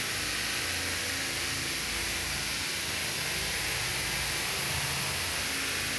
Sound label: Panned Noise, non tempo loops